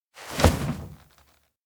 face bury arm; anemoi

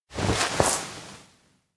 crouch lean; koyomi; anemoi パッケージ版

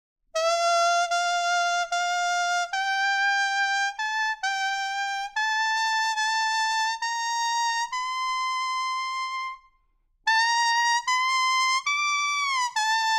kusa Hurusato; anemoi

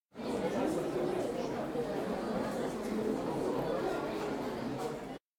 townhall murmur; anemoi

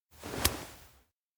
take hand; anemoi

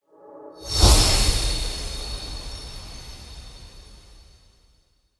wish integrate; anemoi

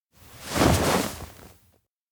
face up; spica; anemoi

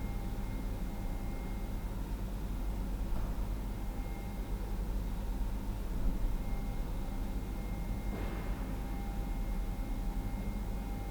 indoor community center day; LOOP; anemoi

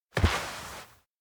dog lie down; anemoi

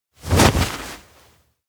forest lie down; anemoi

